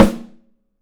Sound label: Studio Snare; 3D Percussives; Studio Snare 01